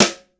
Snares

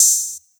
Open Hi Hat; Open Hats

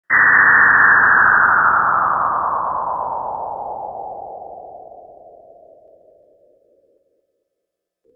Broken Ship

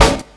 Snares

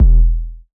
808 sub kick E

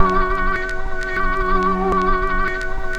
Sounds & Instruments

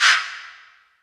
shaker scratch

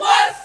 "Whus", vox

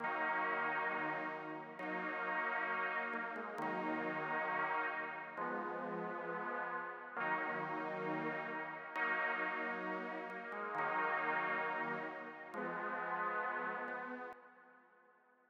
Pad; Boomin-Beat-Starter

